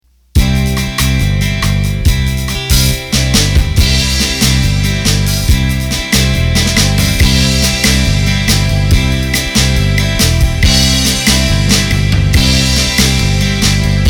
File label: 60's Rumba; roland bk7m